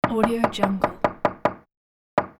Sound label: wooden door knocks